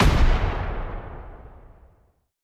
Hydra Hit

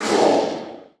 Finalboss Vox Damage

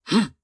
Shield; Col Shield 02

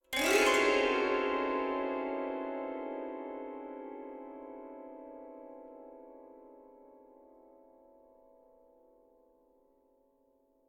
Combat Zither Cluster; curious expedition 2 macos ps4 switch windows xbox one xbox series xs gamerip 2021

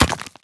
Stone Arrow Hit

